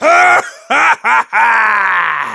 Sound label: laugh happy, de; Demoman